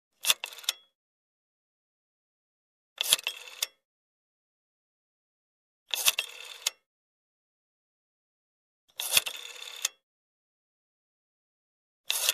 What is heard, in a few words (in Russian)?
telefon